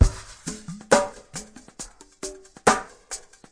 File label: 068 bpm deliberate groove, drum loops and breaks